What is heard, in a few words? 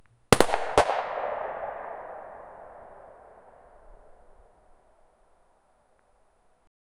a shot from a 37 millimet, artillery